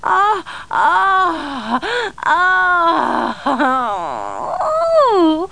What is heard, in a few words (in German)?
stoehn; Virtual Casino: Secret Fantasies